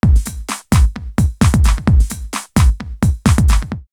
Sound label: 909 Bouncing BD 130, drumloops, DrumMachines; X-Static Goldmine